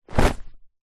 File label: jacket safety thick fluro hi vis drop floor; zapsplat; foley